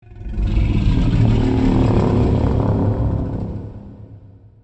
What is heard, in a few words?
atomic growl; godzilla 2014